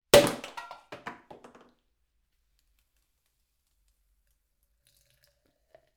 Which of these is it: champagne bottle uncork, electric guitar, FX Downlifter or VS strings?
champagne bottle uncork